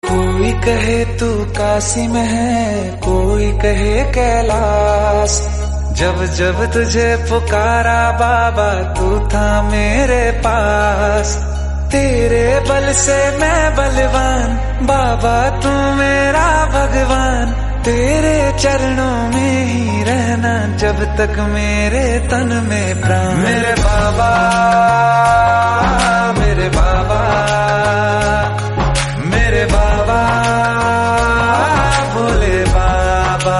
devotional hindi

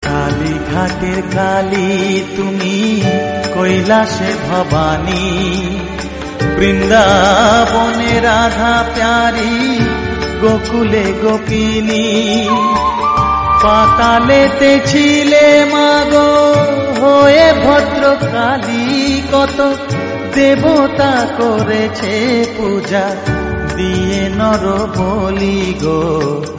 bengali

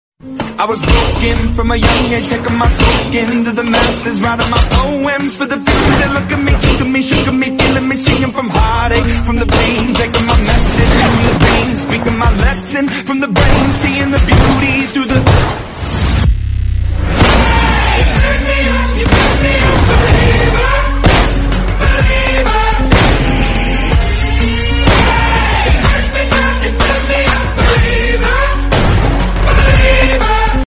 english song

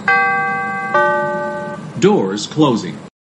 doors closing

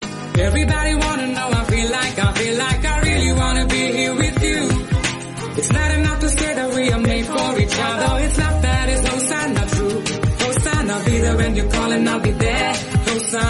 love poetry background music

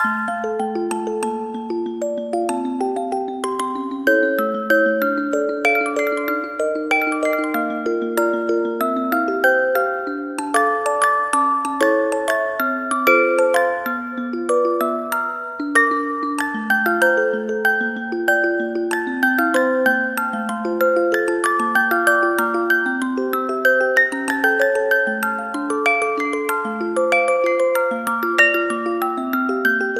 music box

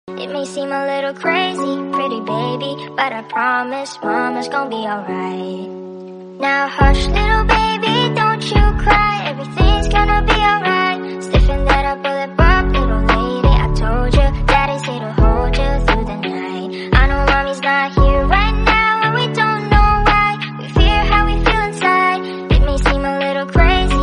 slowed reverb english song

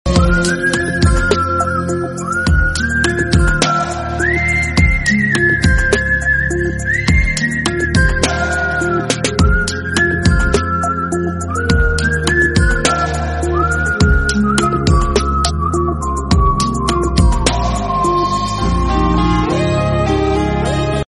instrumental song

